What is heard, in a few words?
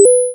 Pickup Coin